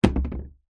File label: household plastic table set down or knock over wood balcony; zapsplat